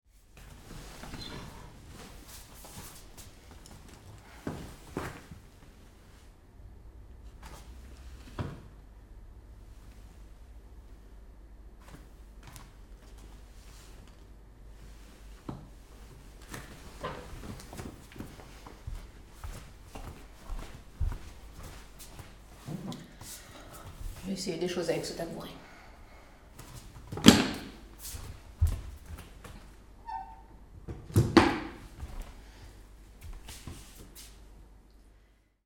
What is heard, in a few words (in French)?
tabouret